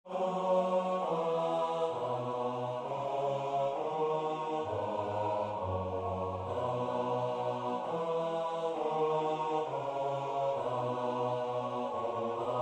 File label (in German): komm gott schoepfer heiliger geist nach bwv 370 bass